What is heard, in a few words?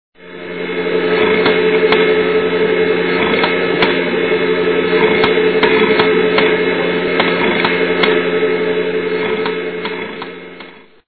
erratic clicking